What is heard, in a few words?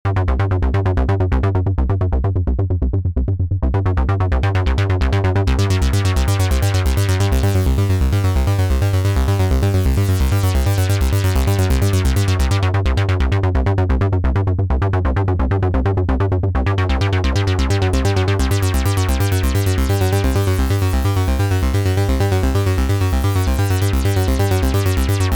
ACL System 2 ACL Filter Overdrive Full Resonance Boost QLIN off on Sweep